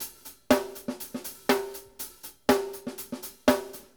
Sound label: hands C, drumworks